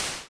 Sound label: move; tanks of freedom